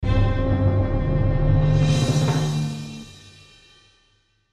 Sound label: Orchestral Stab, Orchestral Stab Percussion Swell; Musical; Blastwave